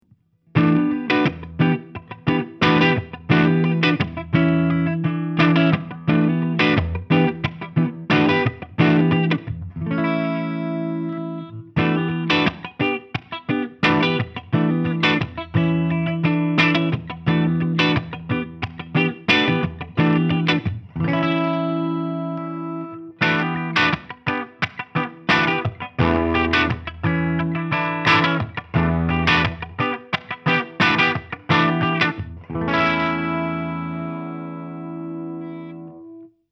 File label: Revv 100R Clean